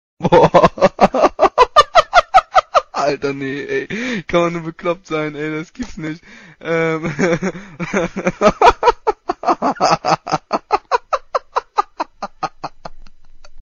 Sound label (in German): krankes lachen lol